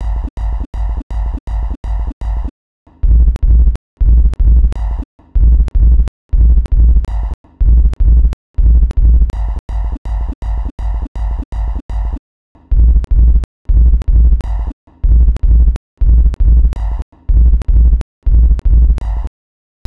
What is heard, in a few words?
Sine Wave